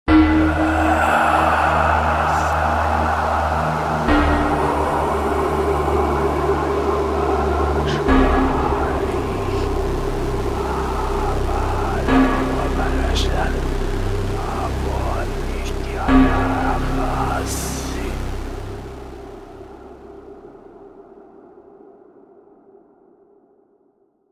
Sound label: ringtone